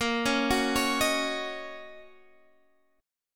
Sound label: D sharp Minor 7th